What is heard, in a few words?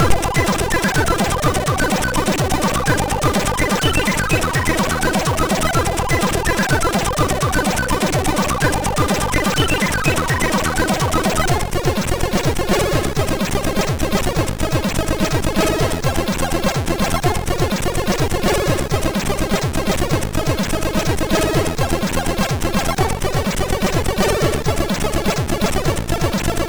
sid MOS6581R3